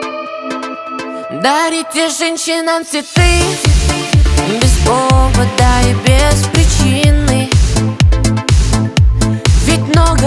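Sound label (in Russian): Rington na zvonok telefona, ringtone for phone call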